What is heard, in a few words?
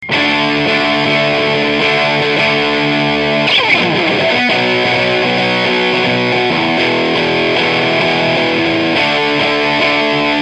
Guitar 1 - Roytek